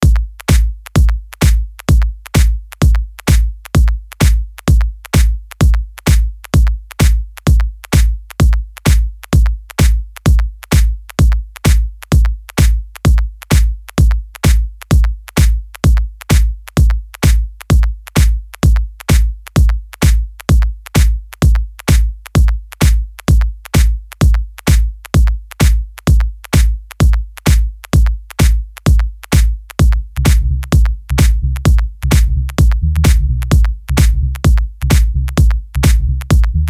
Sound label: sexy high note sfx sequence